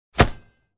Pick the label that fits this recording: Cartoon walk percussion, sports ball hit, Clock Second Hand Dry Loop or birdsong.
sports ball hit